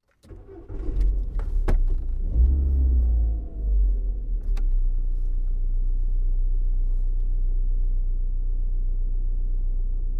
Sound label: car start idle internal; spoteffects